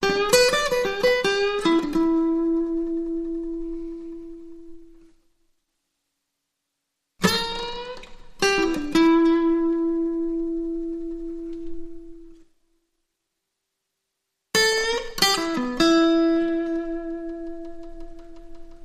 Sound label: Blues Licks